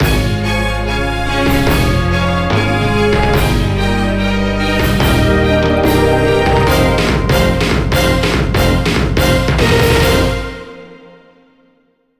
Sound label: Jingle Huge Complite